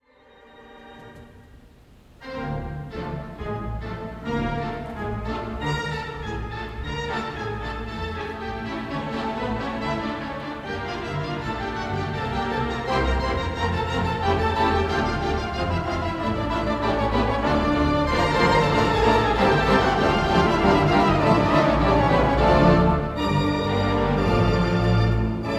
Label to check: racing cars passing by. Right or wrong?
Wrong